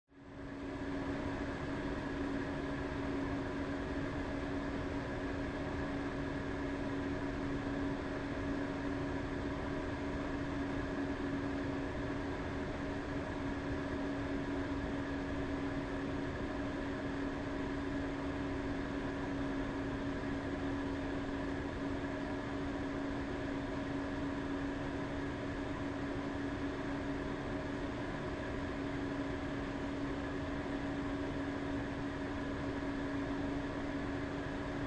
Household, Bathroom Fan, Overhead Fan Running, Close Perspective